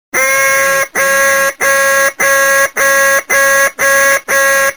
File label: hail call